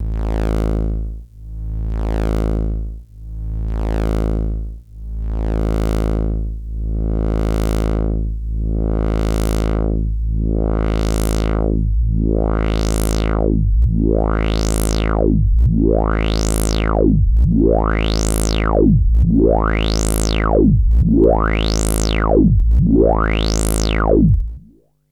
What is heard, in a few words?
CS LPF, Eurorack filter